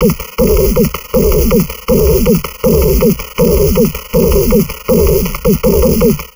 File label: 160, Drum Loops Crazy Processed